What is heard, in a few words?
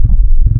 oboe, wavetable; Adventure Kid